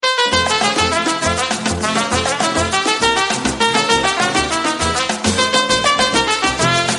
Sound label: mexican hat dance